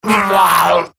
Death Eximus Grineer Male; Battle Barks

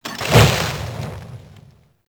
Freedom Thrower Start; Warframe; Caliber Chicks Minigame; Weapons

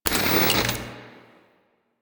TV Hub Turn On; Warframe; NineteenNinetyNine

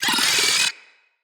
Arachnoid Micro Vocal Attack; Enemies; Corpus

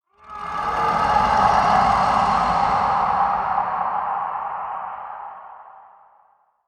Distant Howl; Warframe; Sacrifice; Umbra

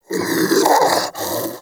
Armadillo Vocal Breathe; Warframe; Characters; Hunting Critters

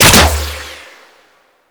Antler Bow Fire; Warframe; Weapons

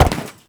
Step Perforated Metal; Warframe; Uriel

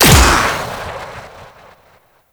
Shot, Infested Dart Pistol; Coda Tysis; Weapons

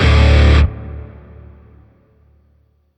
Shawzin Yo Chord B; Music; Warframe; Grineer; Chords

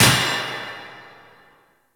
Worker Hammer Impact; Ambience; Props; Warframe; Venus Open World; Venus Solaris United

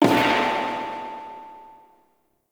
UI Focus Unlock

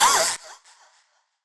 Girl Pain; Dialog; Warframe; Zariman; Skitter Girl

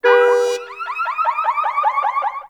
Car Alarm Destroy; Warframe; Ambience; Nineteen Ninety Nine

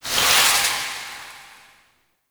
Projectile Death Small, Weapons; Warframe; Duviri; Incarnon Adapters

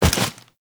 Generic Jump; Warframe; Characters

